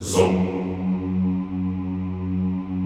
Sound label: ZOOMMM, Extended Classical Choir; Best Service